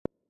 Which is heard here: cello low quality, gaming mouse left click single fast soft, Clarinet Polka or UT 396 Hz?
UT 396 Hz